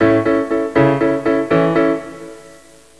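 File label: PIANO ARPEGGIO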